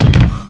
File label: mecha powerloader step